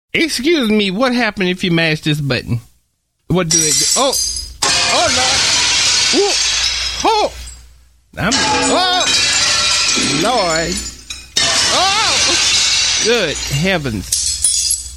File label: radio station sound effects; jingles and sound effects